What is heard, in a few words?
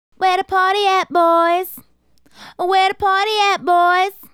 Female Solo, Hip-Hop and RnB Vocals